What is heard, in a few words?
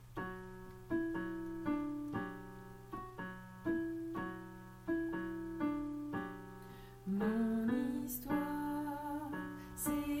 soprano